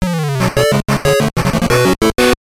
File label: Jingle; Ninku Gaiden Hiroyuki Daikatsugeki; game gear